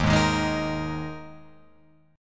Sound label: Eb Suspended 4th Eb down Guitar Open F; Ebsus4 strummed; JGuitar